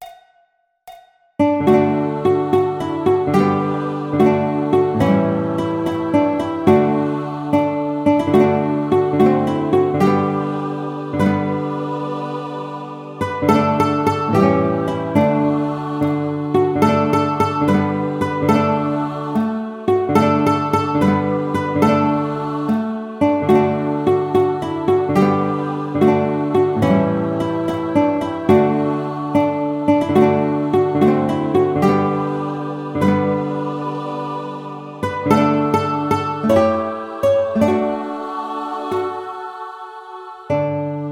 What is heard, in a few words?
For He's A Jolly Good Fellow Guitar